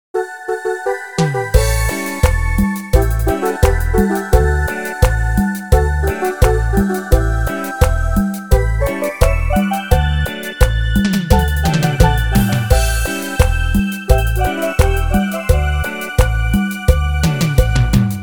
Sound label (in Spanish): Cumbia